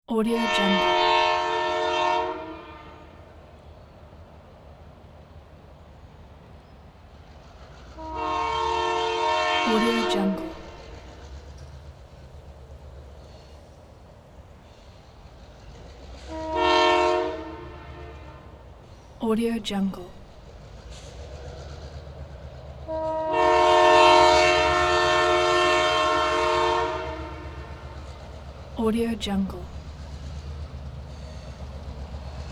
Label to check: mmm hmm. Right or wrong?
Wrong